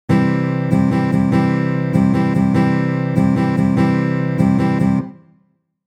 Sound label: stroke, guitar